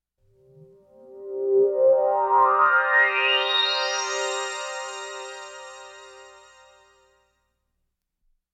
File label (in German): weltraum lichtquelle, Science Fiction, Geraeusche